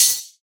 Open Hihat; Drum One Shots; Cymbals

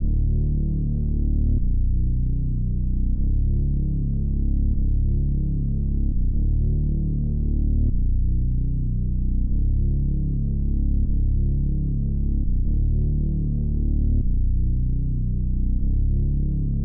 152 BPM F# Min Bass; Melody Loops; Loop Stems; Hip Hop; Cymatics

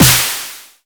Snare, Dubstep One-Shots Drums; Ghosthack; AC2024 - Day 13 - Dubstep Sounds 2025; Grayness

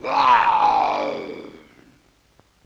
LoFi Synthetic Lion Roar; Vintage Cartoon; Comedy Vocals

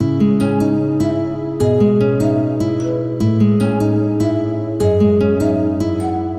Nostalgic Melody Loop - 150 BPM C# Min; Cymatics; 2020 Melody Collection

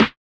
Downsample Snare - A; Lofi

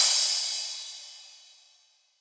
Crash, Cymbals; Cymatics; Dubstep Starter Pack; Drums - One Shots

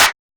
Clap, Techno; Techno Sounds 2024

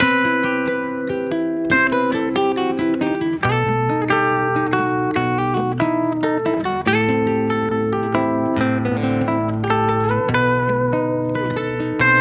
Guitar Loop - 140 BPM E Min, Dry; Melodics; Instrument Loops; Guitars; Cymatics; Cobra Hip Hop Sample Pack

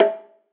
Bongo, Amapiano; Drums; Afro Drums; One Shots; Ghosthack; Drum Mega Bundle

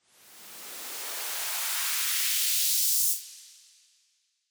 White Noise Up; Cymatics; Dubstep Starter Pack

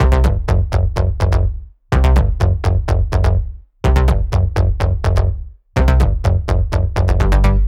Bass Gm 125Bpm, techno; Bass Loops; ToolBox; Ghosthack Advent Calendar - Day 6 - Techno Kit

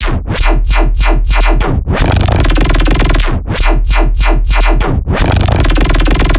Bass Loop D#, Dubstep, 150bpm; Laserworld; Ghosthack; Dubstep Sound Arsenal

